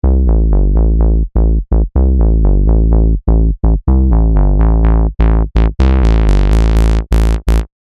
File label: BS Deeper Saw